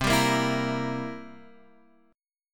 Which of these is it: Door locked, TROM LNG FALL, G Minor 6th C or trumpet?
G Minor 6th C